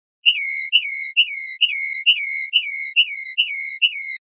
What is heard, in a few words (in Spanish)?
sonaja jia jia, pardillo